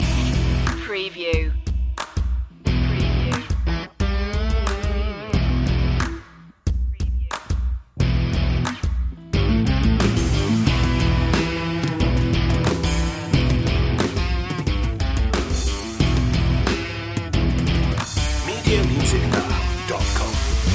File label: biker babe loop